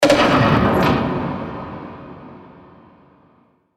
ambient castle door

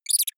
mouse squeak

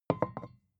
vodka put down tipping hard

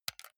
console controller xbox rb press

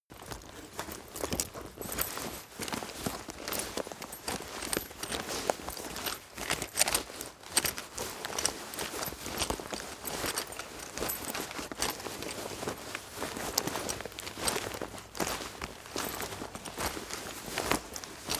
leather metal handling sequence

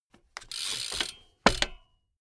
bmx bike pedal grip freewheel

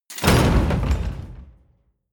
gate slam small

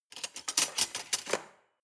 cutlery large drawer rattle hard

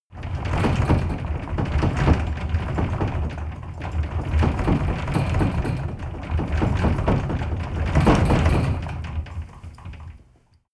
interior rattle hard

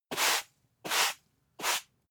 broom sweep sequence

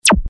syntetic zap

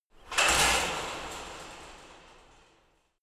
metal fence big rattle

